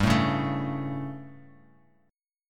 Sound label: G Suspended 2nd Flat 5th G down Bass Standard, chord; Gsus2b5 strummed; JGuitar